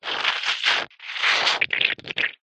liquid frothy squelch squeeze

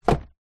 cardboard box down on wood surface; foley

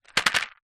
jewelry earings new on card several drop carpet

